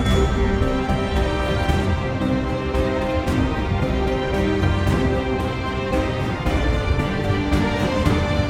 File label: Classical rb